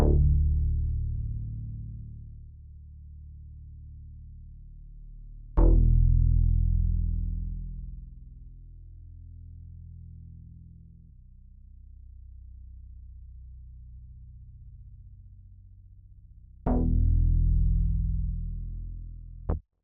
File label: SUB BASS